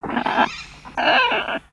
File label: Bird Amazon One shots; Lego; ambience